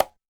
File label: Mute Hi Conga, TR626; Drums; Hits